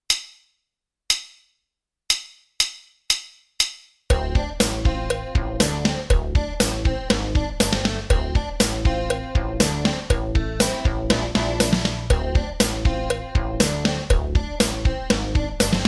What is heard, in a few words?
Etude in Syncopation Track